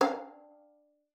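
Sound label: finger F5, violin; Xsample VOL-5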